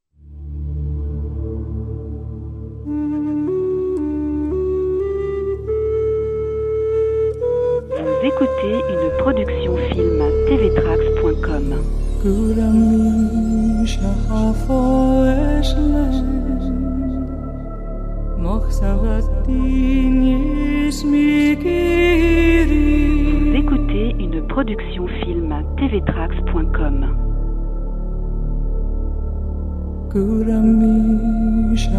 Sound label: Celtic voice